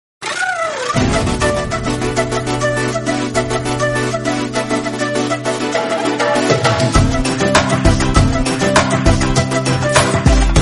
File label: Love Bgm Tamil; Master; Vijay Thalapathy